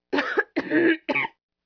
infirmary, Ambient; Zeus

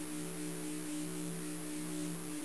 hum; Gunlok

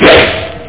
thwoff

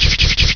Spinner Shot; Spectre Supreme Demo

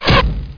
arrow; Monster Media Number 15

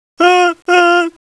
HONK

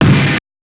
FX shot, Drum+Bass, 160; eJay

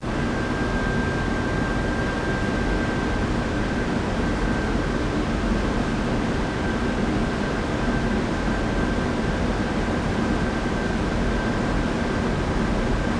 ferry; WAV Sound Effects; transpo; sea

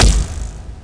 WOOD; thief

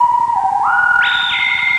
spooky, cartoon sfx

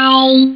weird

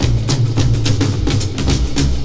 D-Kit

